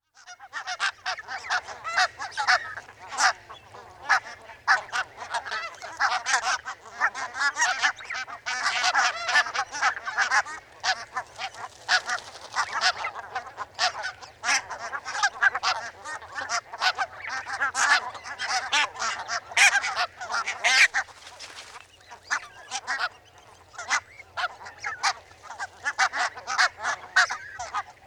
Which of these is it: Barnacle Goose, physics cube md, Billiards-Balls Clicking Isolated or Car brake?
Barnacle Goose